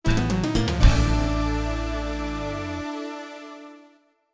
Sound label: Game Over (TG-100 Version); Asuka 120% Burning Fest.; X68000